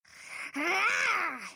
rawr; fire emblem heroes

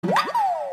catch sound; animal crossing

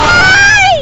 aiiiiiiiii

